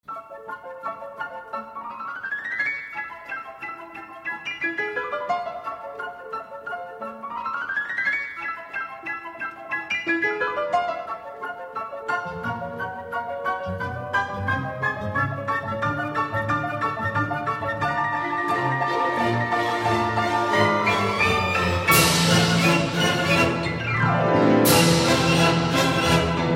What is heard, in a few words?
Allegro animato, Piano Concerto No.2; Liszt